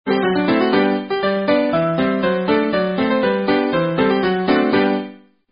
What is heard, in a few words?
Midi